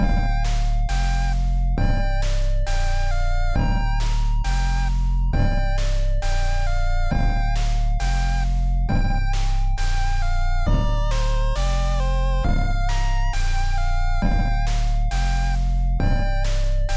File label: 8bit